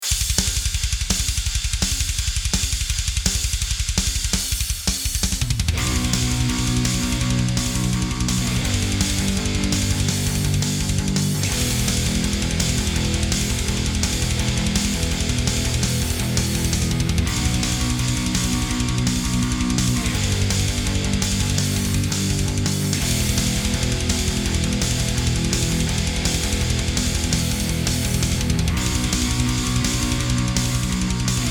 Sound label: Sweep Picking BTrack